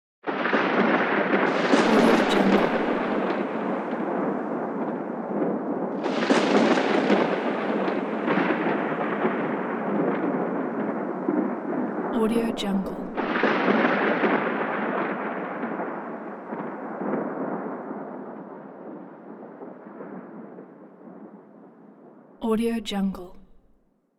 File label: rolling thunder